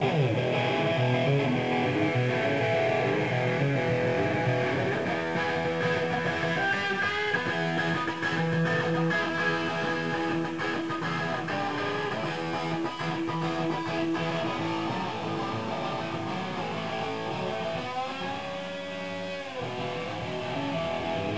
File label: GUITAR